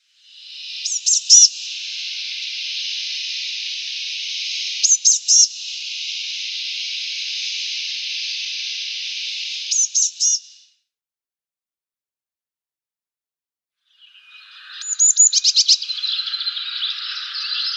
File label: Azure Tit calls